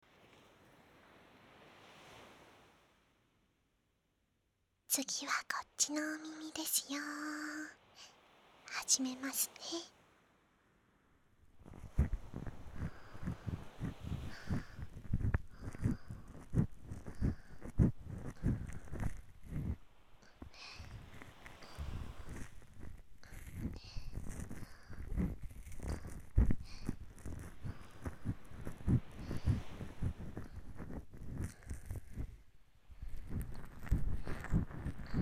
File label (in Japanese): ビーチで耳かき・お耳冷やし(左耳)